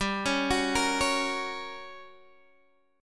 G Minor Major 7th Flat 5th, G, down, Guitar, DADGAD